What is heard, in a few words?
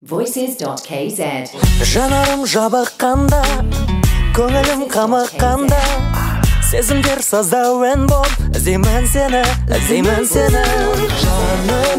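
Kazakh vocal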